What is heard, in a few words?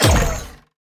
tower twlight Longbows basic Attack cast no Charge